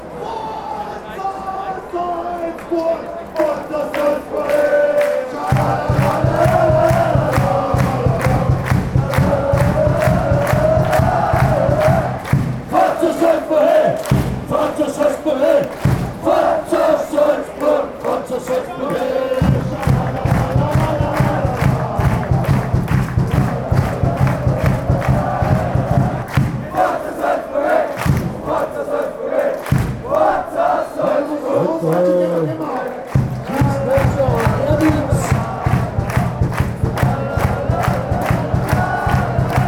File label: forza salzburg fanchants